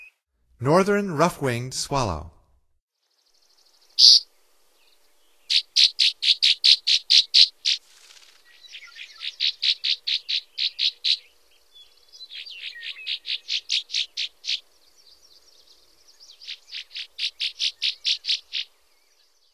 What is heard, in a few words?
Northern Rough-winged Swallow, Songs of Birds of North America; Stokes